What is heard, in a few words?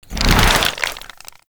Infested K Drive Mount Dismount